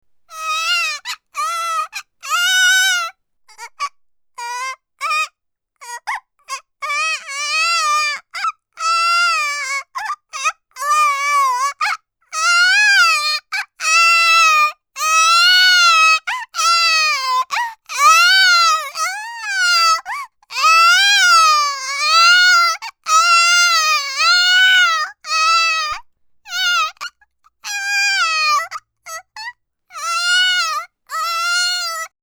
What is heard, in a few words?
baby